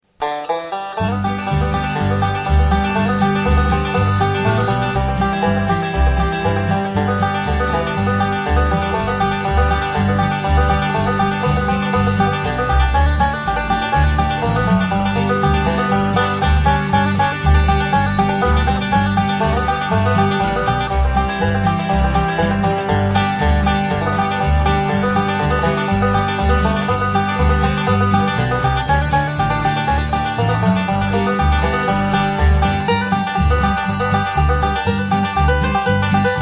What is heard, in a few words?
Banjo; Intro to the Banjo Course Sample